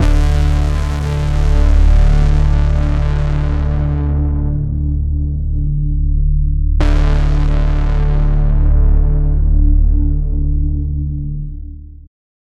a distorted and punchy, bass

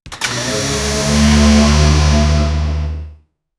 a rusty Honda Civic GTR; realism

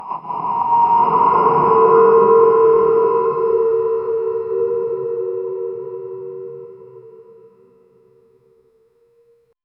horror metallic clang

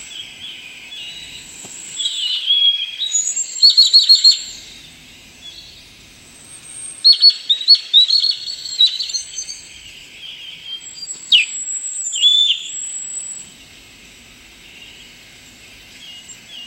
produce continuous uninte, animal sounds

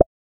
single soft playful button

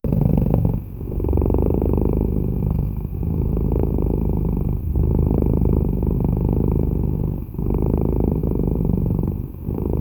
a cat waiting excitedly

